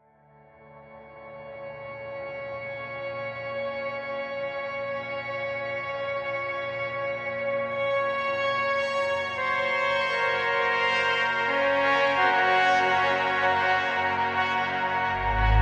Synthetic Silence

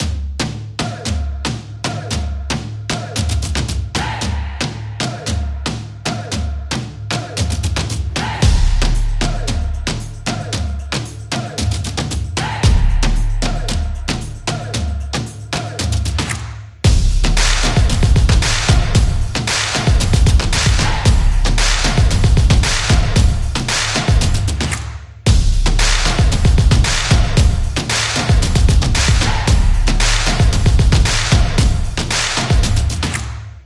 Upbeat Drum Sport Beat; action sport

